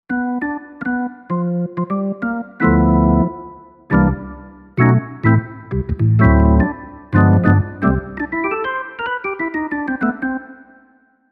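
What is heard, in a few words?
organ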